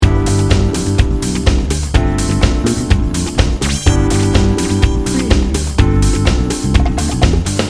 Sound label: celebration funk house loop